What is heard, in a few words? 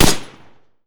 combine mg mid; weapons; Half Life Alyx